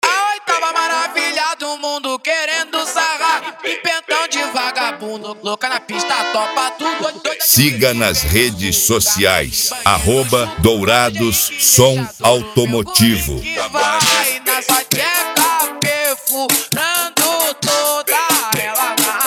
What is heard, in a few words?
mega funk; dourados